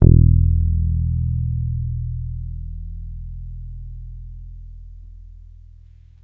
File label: Bass Long Low E, Electric Bass One Shots; Slate Digital BASSHOLE Sample Pack